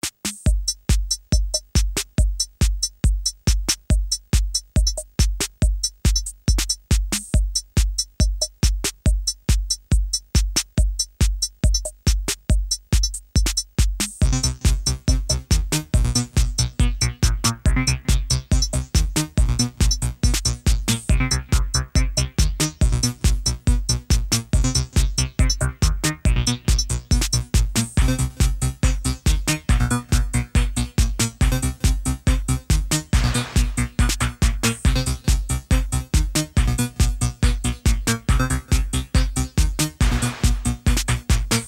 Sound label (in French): Orgue Electronique